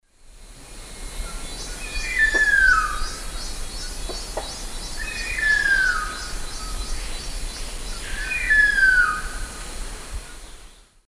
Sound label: Harpia harpyja, calls; S. America; Eastern Lowlands